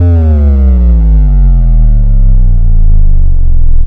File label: BD, Dance Mega Drums, BD HOU; Best Service